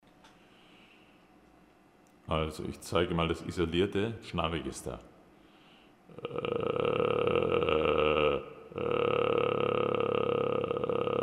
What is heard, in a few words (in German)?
Schnarrregister